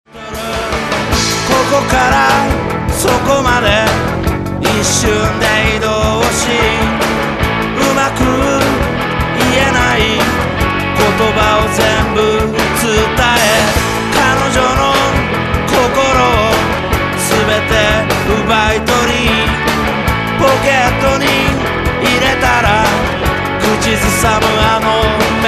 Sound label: music